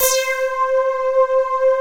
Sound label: PAN TONES; Pro-Rec - Synthsonic; TRINITY